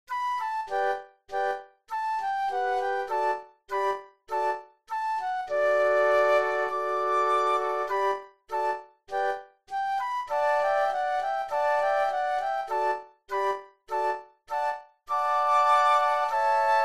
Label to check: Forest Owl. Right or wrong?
Wrong